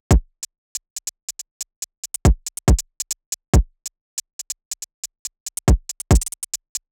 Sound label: kick